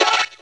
techno; E-Lab; X Static Goldmine 1

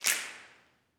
SNAPS, Gospel; Spectrasonics; Vocal Planet Vol.1 Gospel; akai

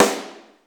SNR

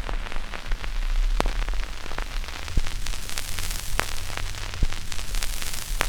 CRACK; E-Lab; X Static Goldmine 2; akai

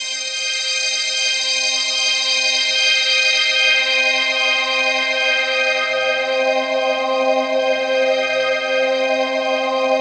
PHASE PAD, ATMO PADS; Best Service; XX Large

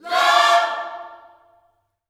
LOVE CHORD, Vocal Gospel

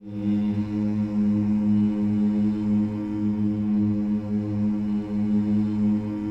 Hmms, Gospel vocal, G#2; Spectrasonics; Vocal Planet Vol.1 Gospel; akai

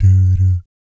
"BS D D", vocal jazz fx

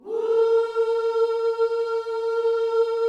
WHOO A#4, Vocal Planet Gospel; Spectrasonics; Vocal Planet Vol.1 Gospel; akai

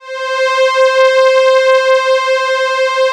Atmo Pads; Best Service; XX Large

